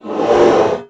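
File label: creature piket attack light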